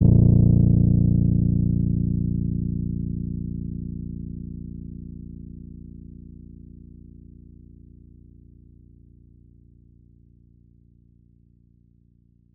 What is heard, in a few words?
Boombap